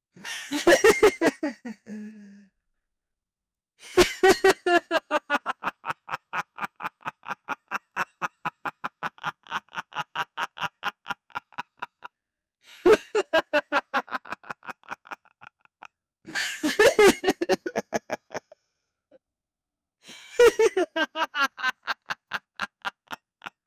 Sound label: psycho laughing